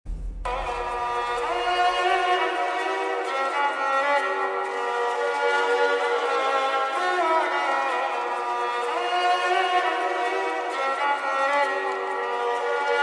viral ringtone new ringtone bgm slowed reverb ringtone attitude ringtone